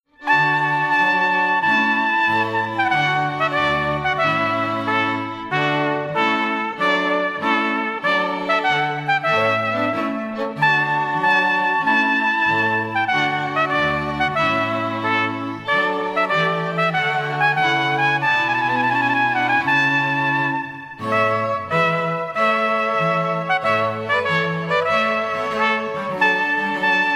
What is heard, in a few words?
Trumpet Tune